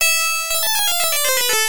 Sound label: Health Bonus Jingle; Titus the Fox; To Marrakech and Back